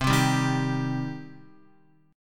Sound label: C Minor C down Guitar; strummed; JGuitar